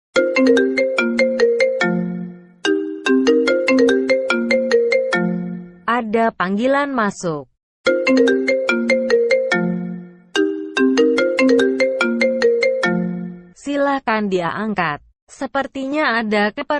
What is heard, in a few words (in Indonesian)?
nada dering telepon masuk lucu